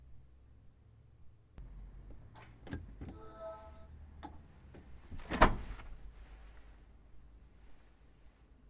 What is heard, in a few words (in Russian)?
vykliuchenie sushylki i dver